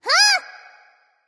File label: nv confused; cupid